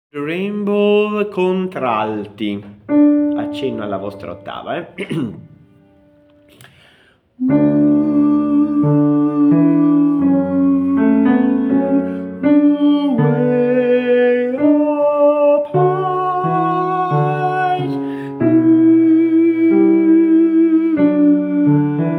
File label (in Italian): alto